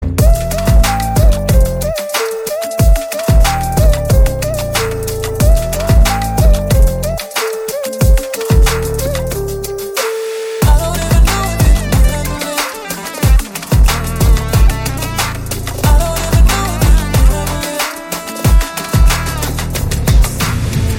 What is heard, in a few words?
Waiting BGM